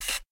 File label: Catapulte Servo